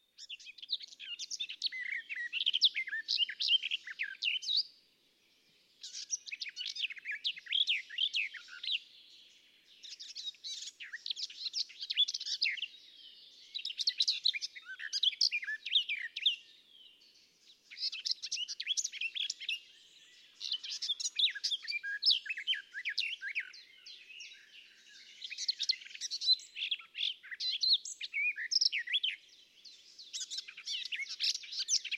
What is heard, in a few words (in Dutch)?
tuinfluiter